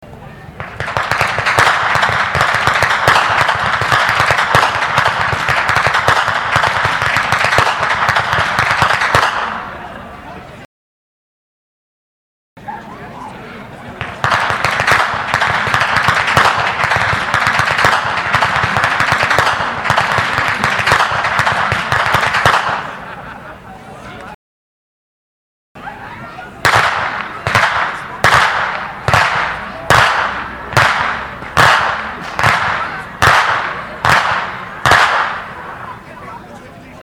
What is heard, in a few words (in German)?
Schnalzen; Feldkirchen; Ainringer Schnalzen